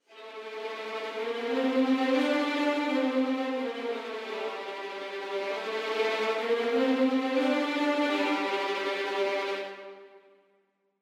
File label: Violins Tremolo; Audio Imperia Areia